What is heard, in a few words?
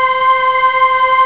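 ORCHESTRA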